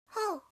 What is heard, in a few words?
hou, voice reaction